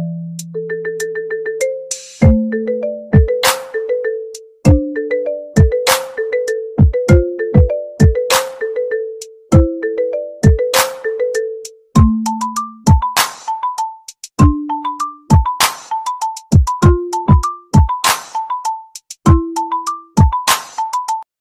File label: Remix; Vivo Nex A